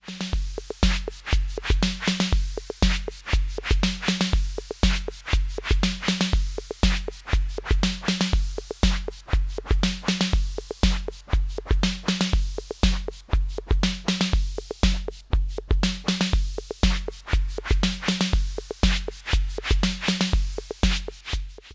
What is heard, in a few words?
Elektron Analog Rytm Noise